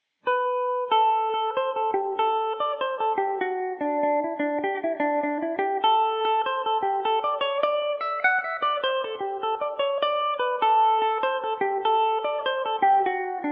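nancy hynes D mixolydian and D major revelation, mandolin; Revelation Jaguar electric mandolin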